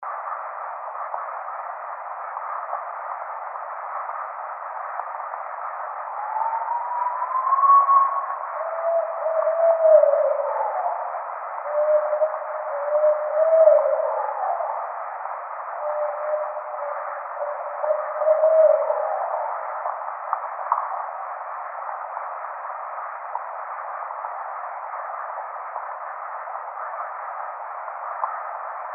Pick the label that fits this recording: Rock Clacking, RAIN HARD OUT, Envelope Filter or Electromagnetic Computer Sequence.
Rock Clacking